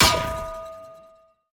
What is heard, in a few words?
goal miss bounce, Ball Collision Goal Frame